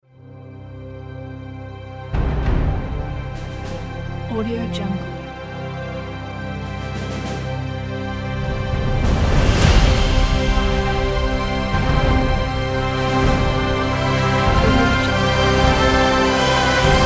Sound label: epic award opening ident